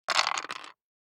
DICE on Wooden Tray, Throw and Roll, Standard, 6 Six Dice; Samples; ArticulatedSounds; DICE Sfx Party (Extended)